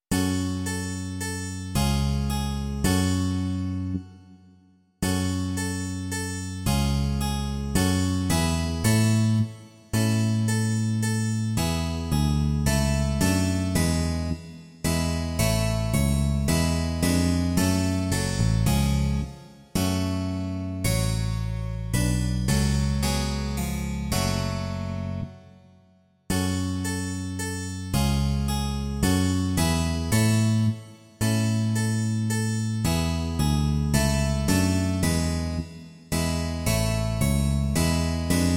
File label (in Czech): cembalo a baskytara; Přeslavný den, v němž Pán přišel; Imaginativní verze